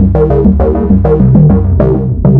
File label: electro 100 loop